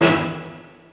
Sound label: Bonus Wheel Symbol; ClubFusion; Showtime Classic